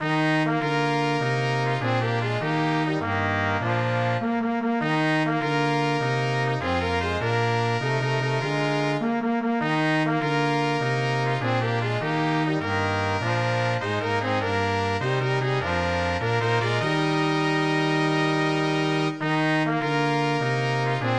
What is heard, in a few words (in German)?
fanfare